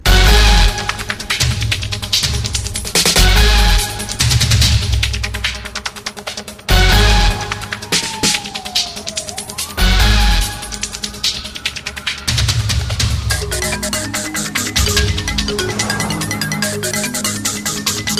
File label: Airport Music